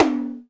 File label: Tom Phase Hi